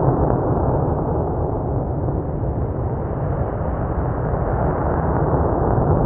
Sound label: Engine loop